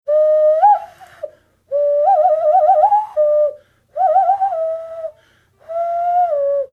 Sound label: doing loon call